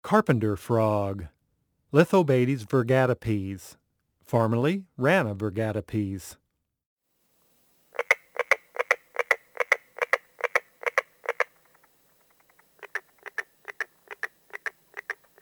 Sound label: Carpenter frog